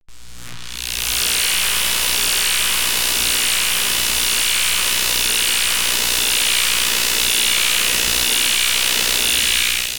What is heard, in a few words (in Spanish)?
zumbido elctrico crecient, electric